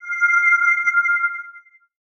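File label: sound of a beam of light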